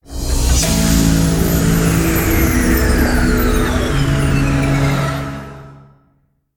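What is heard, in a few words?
power aoe; smite; gilgamesh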